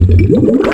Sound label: Water Bubble, wavetables; Animoog